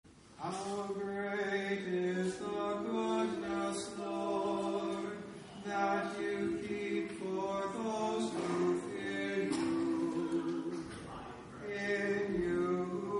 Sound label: Communion Antiphon